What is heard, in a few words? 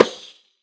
Riq Slap, Hand Drums; SoundBytes; Early Patches